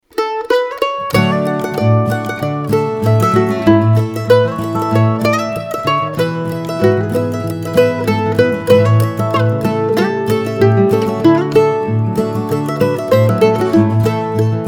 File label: Mando